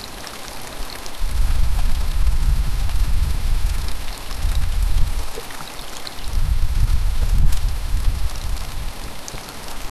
the continuous wild whist; vast

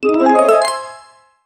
elegant casino

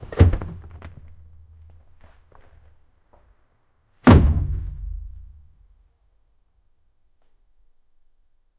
thunk and dropping hiss; lowering

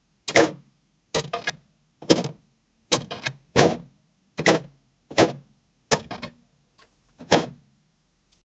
keeping tyre on tyre; tire

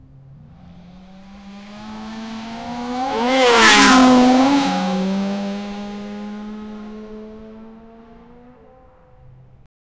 loud sport cars racing, far

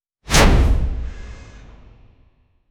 powerful sharp sound; introduction

